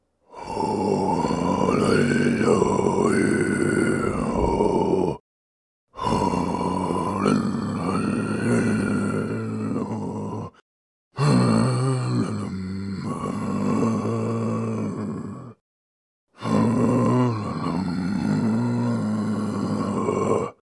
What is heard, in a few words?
Loam sing; Hollow Knight: Silksong